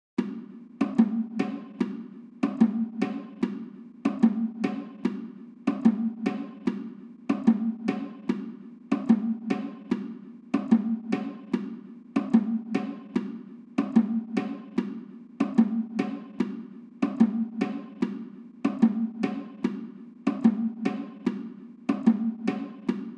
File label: Bongos